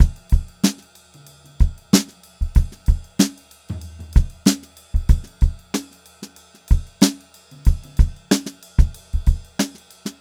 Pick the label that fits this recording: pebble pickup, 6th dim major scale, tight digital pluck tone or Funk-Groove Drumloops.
Funk-Groove Drumloops